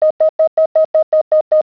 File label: radar